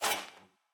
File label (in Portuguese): Coruja ataque